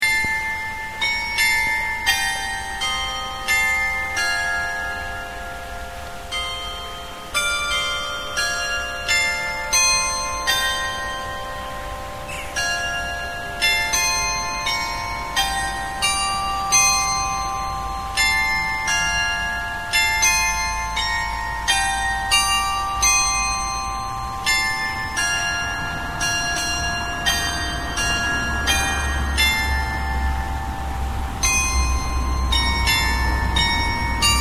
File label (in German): altes rathaus glockenspiel